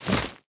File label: Wing Flap Tiny Death